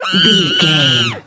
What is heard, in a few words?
voice female scream